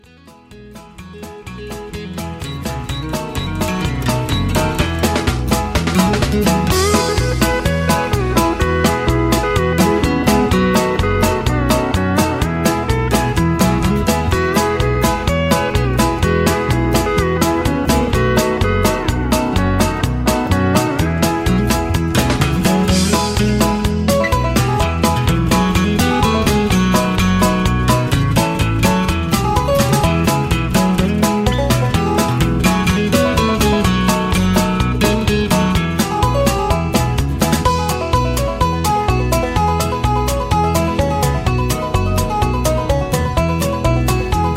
Instrumental

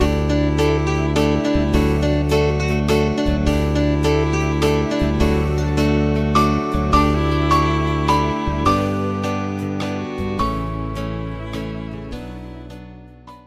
Instrumental Waltz

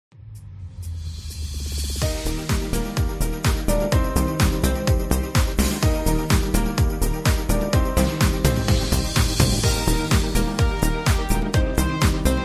Instrumental with Harmony

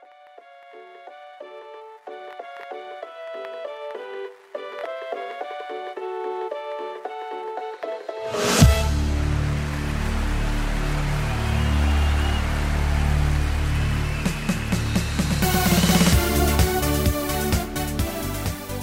Patter

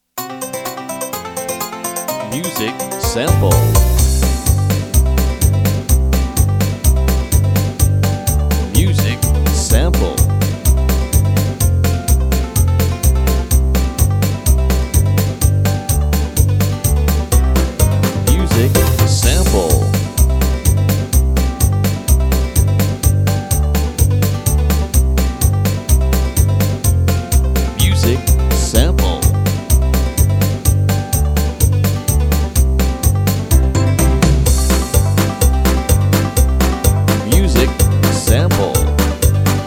Hoedown

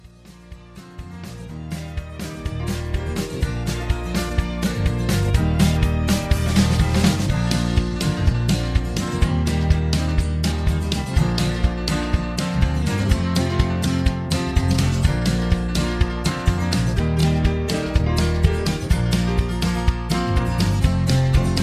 Instrumental Lower Key

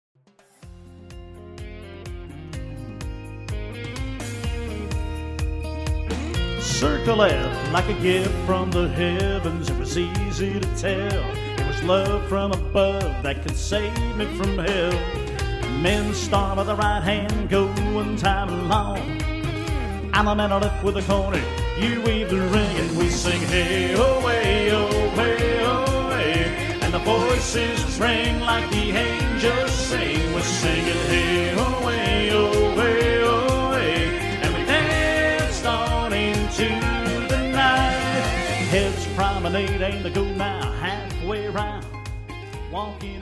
Vocal

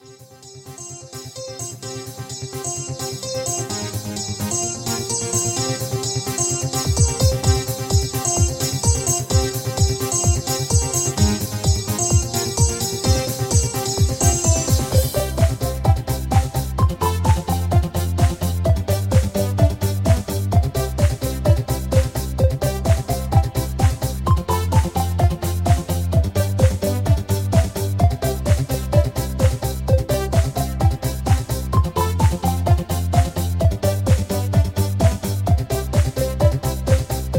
Patter

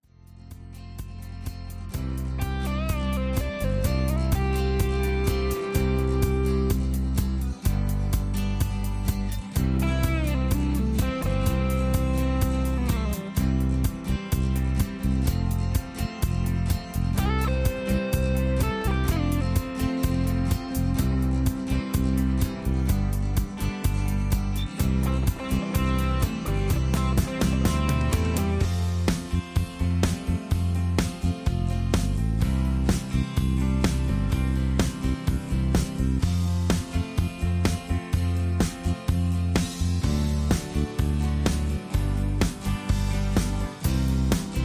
Instrumental with No Leads

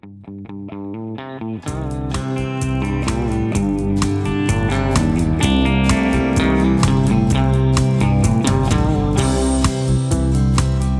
Instrumental with Melody

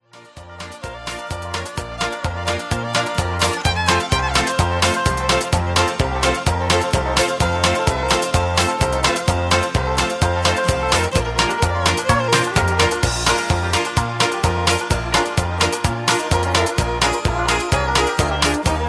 Patter

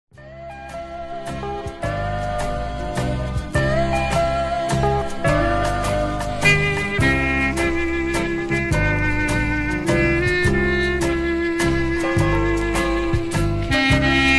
Waltz, Instrumental

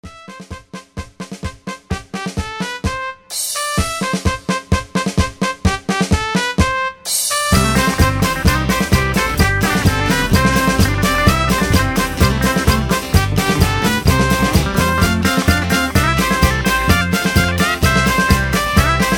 Instrumental

